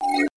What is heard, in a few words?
pickup atomic health